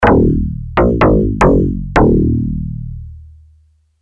DX7II; yamaha; synth

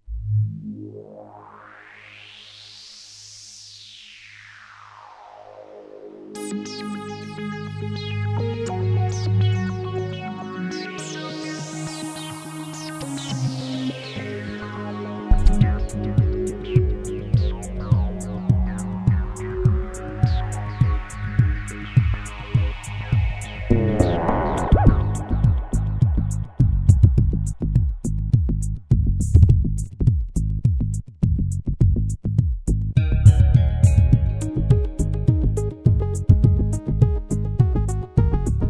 korg z1; synth

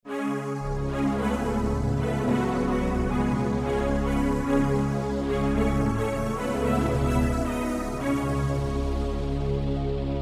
pad epic, Yamaha TG500

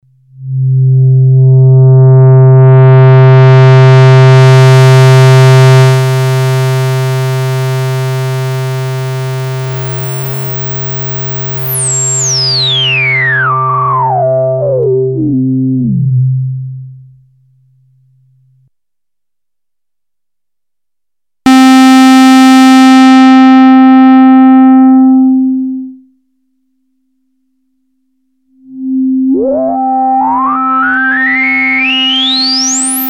test filter, Moog minitaur

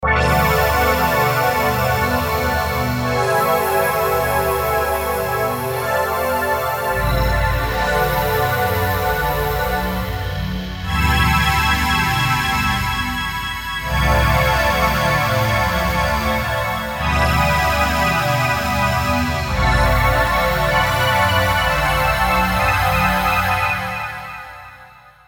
pad pan, Pad panning, Emu Planet Phatt; a Motorola MC 68000 CPU (mc68hc00fn10) system handles up to 32 voices of polyphony

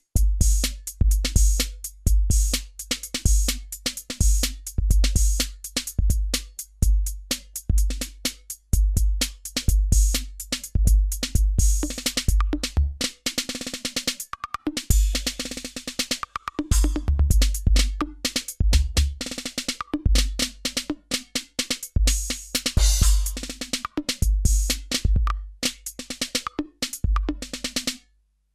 drum tr808, Alesis qsr synth

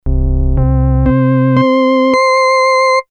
dx21 tease; MUSIC TRACKER

